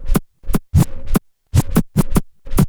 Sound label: scra, Scratches; The Tables